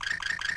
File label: Racoon Special